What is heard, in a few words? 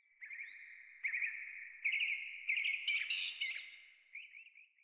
coast bird; matmos hl; wildlife